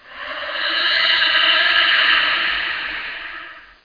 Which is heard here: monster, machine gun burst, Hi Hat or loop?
monster